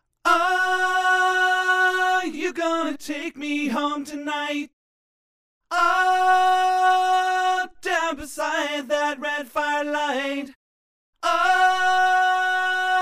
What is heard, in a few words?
Melody